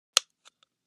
Light Switches Click

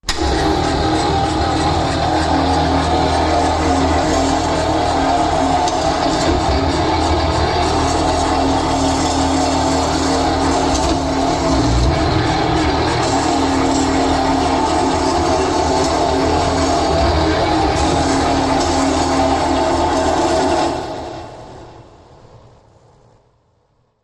Slicer Machine, machines; winches

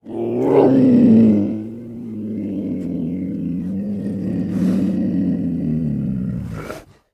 Leopard Growl Hollow; big cats

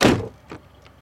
Door Close Ext Outsi

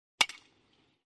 Aluminum Bat Hits Baseball; baseball fx & games

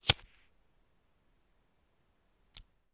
Bic Lighter Light; lighters & matches

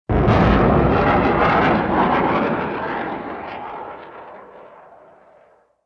Surface to Air Missile Lnch, exterior

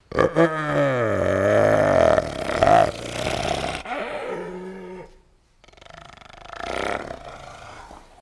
Hyena Bigger Asthmatic Exhale, Growl

